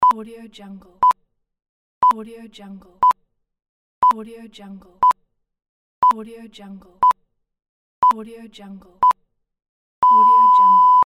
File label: countdown beep tones